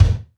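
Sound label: GATE kick, drum; Crypton - DrumBase5000; GATE KITS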